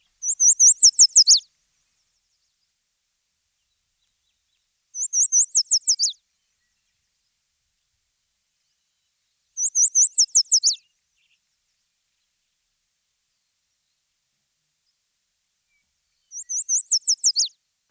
Yellow Warbler Song

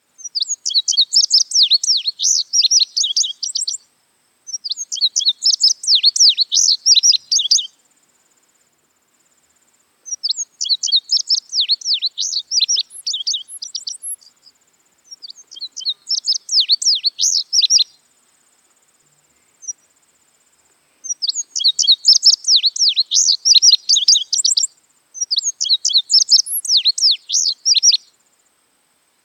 Indigo Bunting Long aggressive song

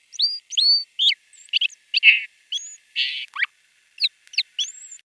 Song Sparrow plastic song; Chris Templeton

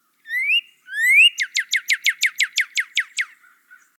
Northern Cardinal

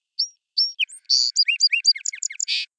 Song Sparrow crystallized song; Chris Templeton

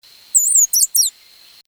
Little Hermit plastic song

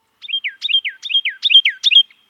Carolina Wren crystallized song; Macaulay Library

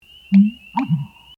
AmericanBittern

